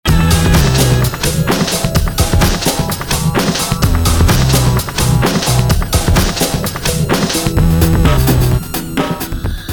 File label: prog trance; prog trance clip